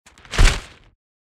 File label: SMACK